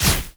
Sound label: Gatling Hit